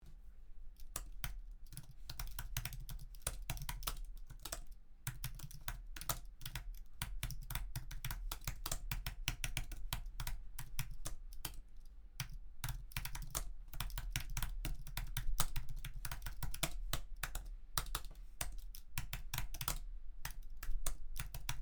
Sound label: apple majic keyboard